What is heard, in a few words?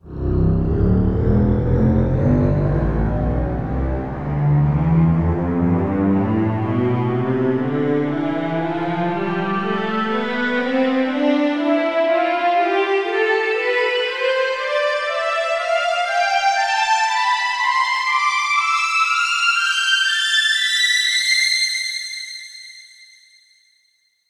slow strings tonleiter